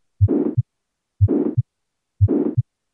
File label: Commotio Cordis; MedicalTraining; Stethoscope Landmarks